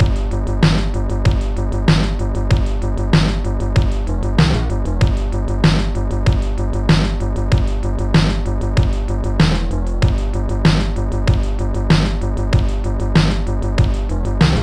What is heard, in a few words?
slow rock; Sonix Music Driver